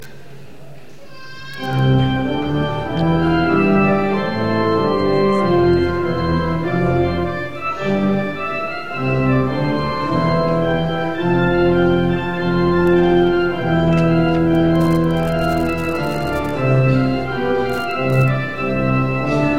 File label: Trumpet Tune